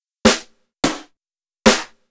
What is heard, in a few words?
Snare, Snare Patterns; Beat Components